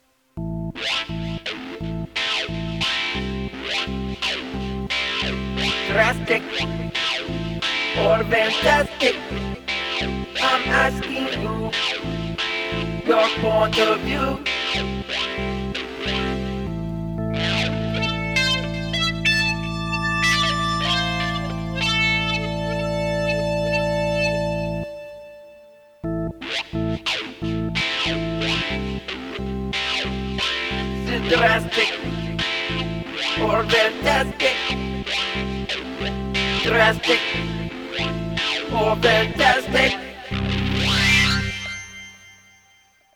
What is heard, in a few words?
electric